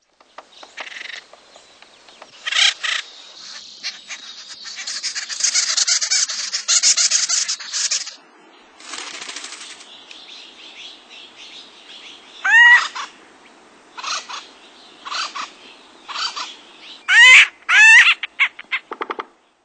Acorn Woodpecker; Birds

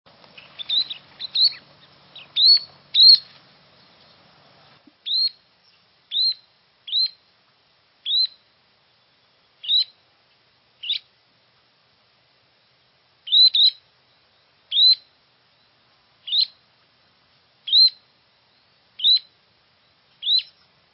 Least Sandpiper; Birds

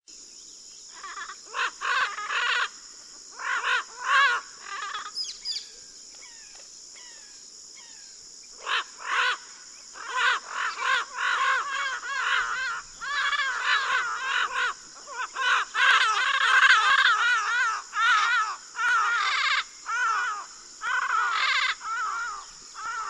Yellow-headed Parrot; Birds

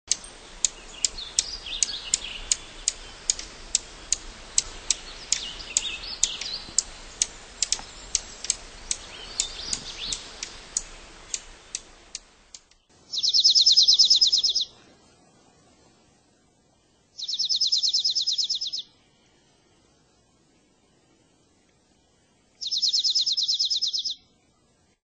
Dark-eyed Junco; Birds

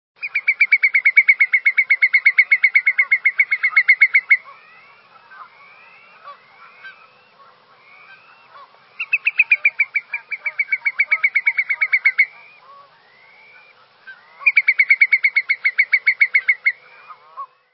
Whimbrel; Birds

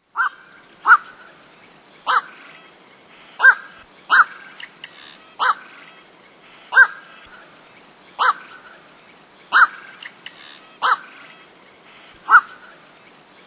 Black-crowned Night-Heron; Birds

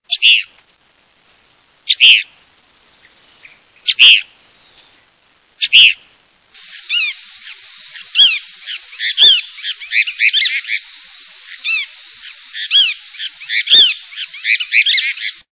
Cassin's Kingbird; Birds

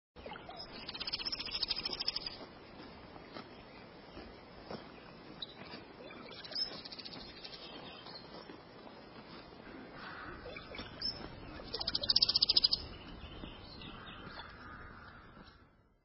Brown-headed Cowbird; Birds

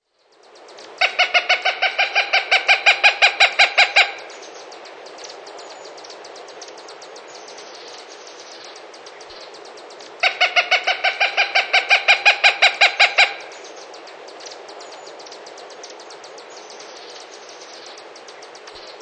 Cooper's Hawk; Birds

